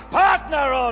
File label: sample, male laugh